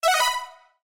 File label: button